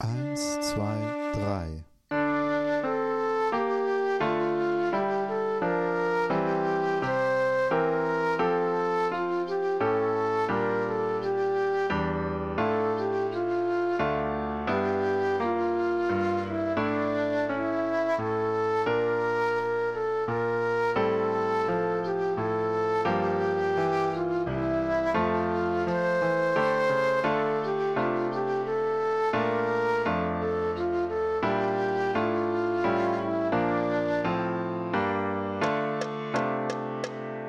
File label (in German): Lektüre Playalong